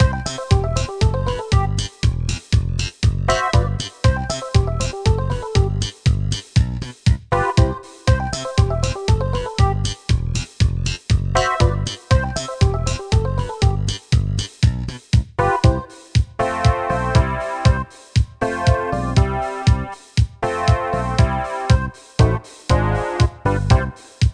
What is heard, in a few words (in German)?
musik stereo; Learn to Play Chess with Fritz Chesster